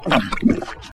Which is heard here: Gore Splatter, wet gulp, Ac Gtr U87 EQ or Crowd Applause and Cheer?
wet gulp